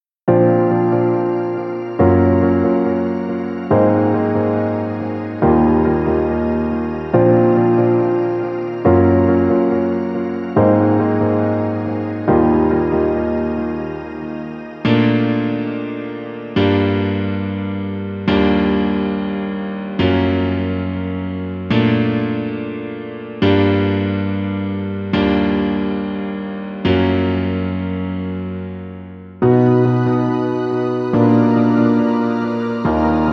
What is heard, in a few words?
piano